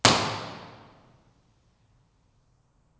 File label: Stairwell Imp Resp 16k